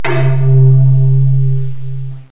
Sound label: panpipe; Sounds Terrific II; woodwind